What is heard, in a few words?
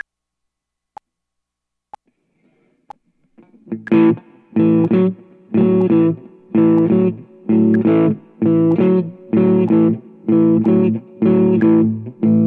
Blues in A